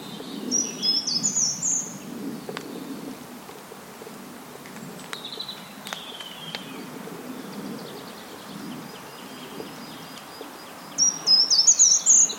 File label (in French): grimpereau jardins